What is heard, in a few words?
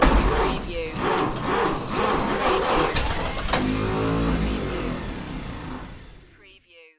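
Model T Ignition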